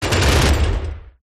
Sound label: pendulumturret firewindup enemy; gow